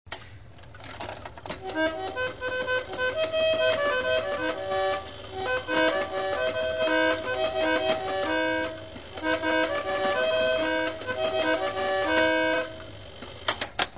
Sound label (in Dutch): trompetter; tonen